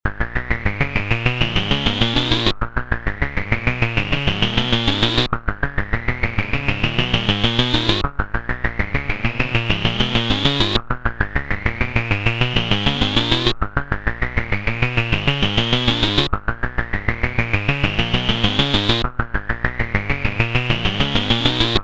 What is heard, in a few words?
sound lab with sequencer 2 (dry); analog synth